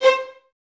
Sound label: Violins C 5, String Ensembles; Miroslav Vitous; LINKS ORCH